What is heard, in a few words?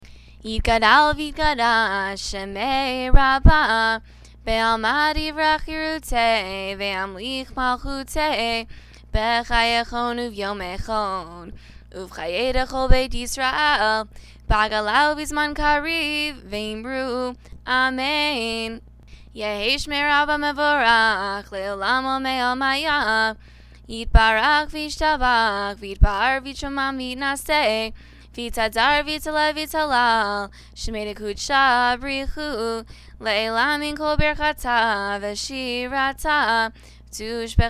hatzikaddish, shabbat mincha